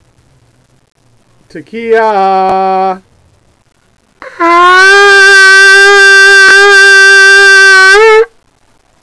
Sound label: tekiah